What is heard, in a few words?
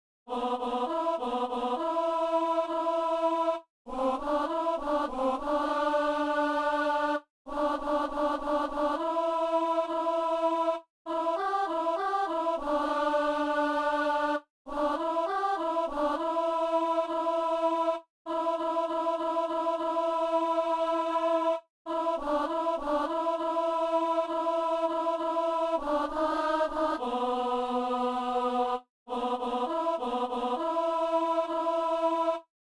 alto; Notre Dieu s'est fait homme